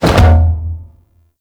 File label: Breakout Hit; Amalgam Carrus Pilot